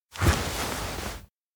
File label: shuffle move kigurumi; anemoi